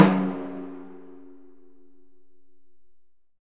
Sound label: DRM, Orchestral Perc; Roland LCDP03